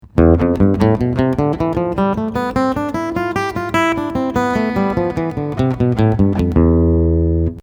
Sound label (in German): Phrygisch Achteltriolen